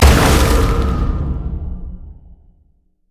launch; LoL; syndra

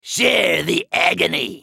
attack; Trundle

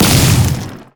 execute; LoL; darius norseking

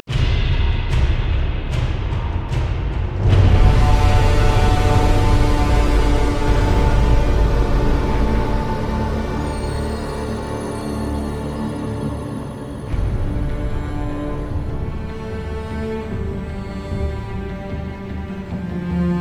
defeat; LoL; howlingabyss

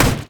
ancient golem basic attack, monsters; LoL

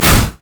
mage chains; LoL; ironforge xerath

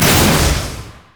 fengshuiengine; riven

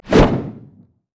barrel roll; LoL; gragas

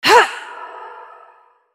effort; Lissandra

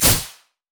shadowswing; zed shadow

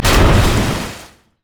surging tides; nami base

